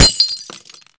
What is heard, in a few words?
glass, bullets impact; hl2